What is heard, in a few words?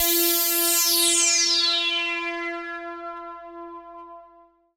ATMO PADS; Best Service; XX Large